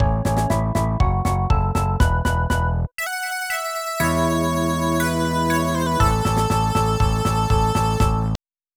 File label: Enka (Success); Shin Megami Tensei if...; PlayStation Soundtrack; Megaten